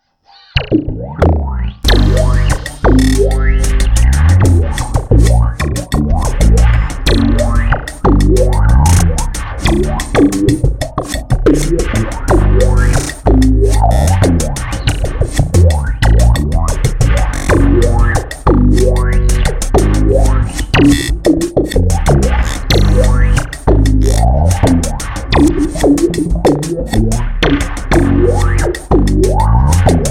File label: me-20b patch; ME-20B Bank 8, No. 2; Funk sound featuring a light, floating feel, good for slap play.